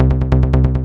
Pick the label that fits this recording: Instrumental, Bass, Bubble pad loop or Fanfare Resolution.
Bass